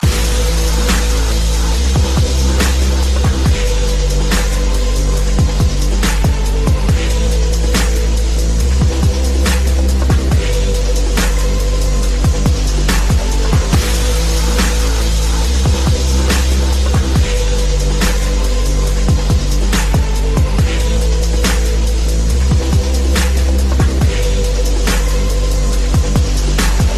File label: Slow Dubstep